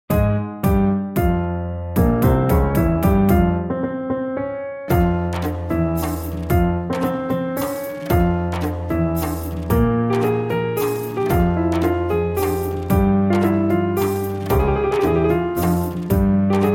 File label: Piano